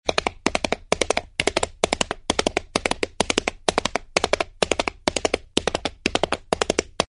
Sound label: animals horse galloping on concrete